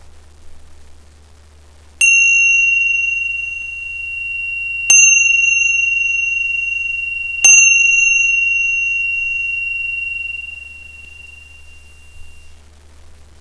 3 Chimese

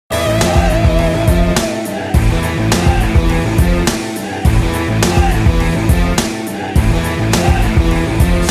Tune

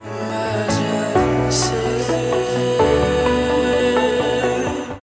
Emergency Sms

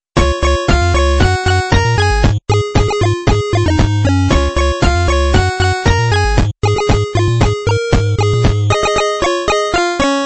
8-bit Wrap Up

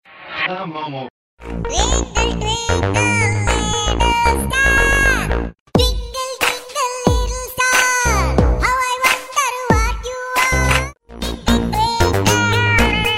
Twinkle Star Mix

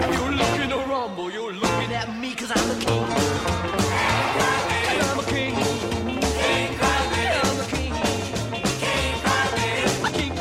Cry Baby

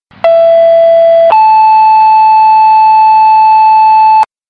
Pager No Beep

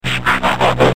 Transform Down

